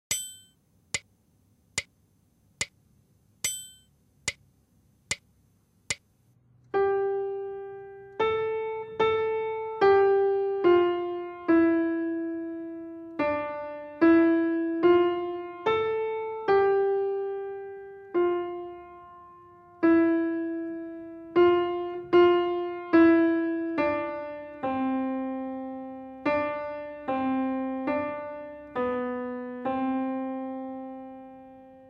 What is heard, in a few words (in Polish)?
dyktando mel rytm